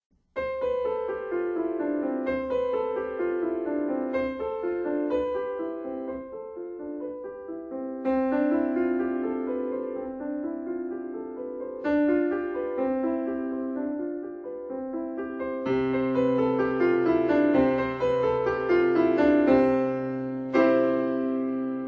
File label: Carillon Bells